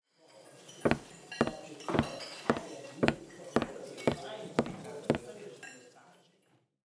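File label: wood parquet stiletto, audiosteps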